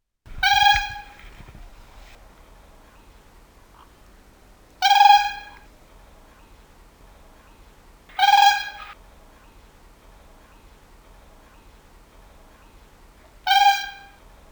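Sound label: sterh golos, Siberian crane voice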